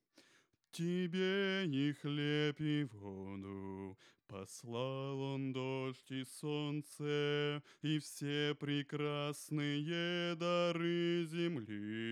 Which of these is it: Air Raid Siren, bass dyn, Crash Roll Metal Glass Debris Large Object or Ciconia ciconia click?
bass dyn